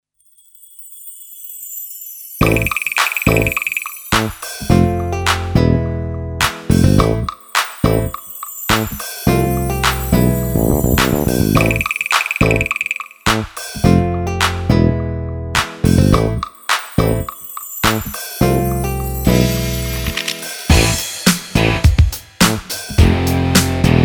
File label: Gold Rock